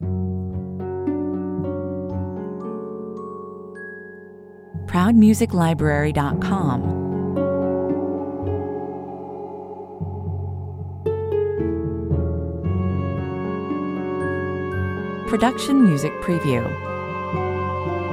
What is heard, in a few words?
entering the dungeon 114 bpm